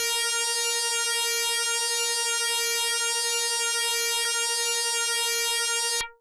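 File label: Electric Bass DX100 A#2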